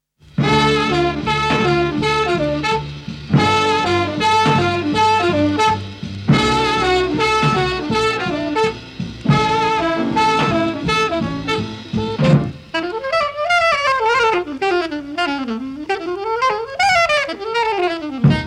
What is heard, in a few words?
Famous Alto Break, jazz